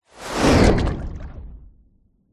fantasy magic spell dark whoosh into impact small water splash; zapsplat